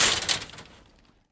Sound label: Metal Fence Hit